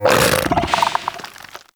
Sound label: Bug Vocal Tranquillized Grunt; Characters; Warframe; Hunting Critters; InfKDriveBug